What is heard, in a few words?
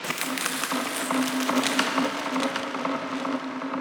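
126, AMBIENT TEXTURE LOOP, C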